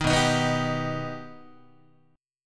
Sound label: B Augmented B Guitar, chord; strummed; JGuitar